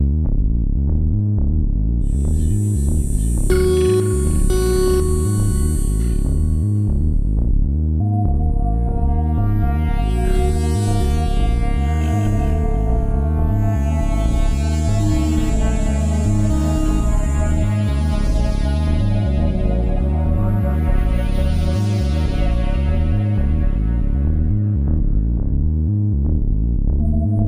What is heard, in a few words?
Pulsing Landscape